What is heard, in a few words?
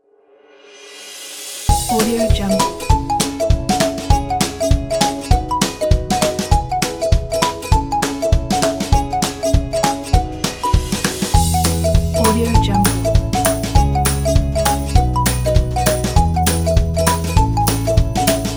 fast hawaiian track; studiogarry